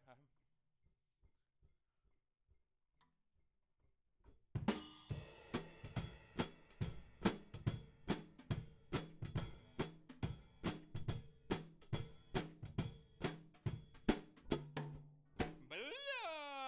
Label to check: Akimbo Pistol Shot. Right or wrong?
Wrong